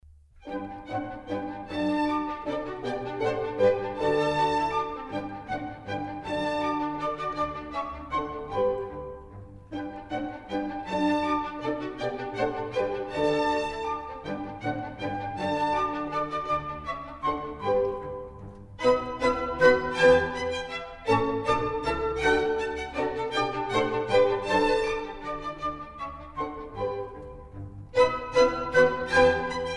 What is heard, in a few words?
German Dances, G Major; Mozart; Dances and Marches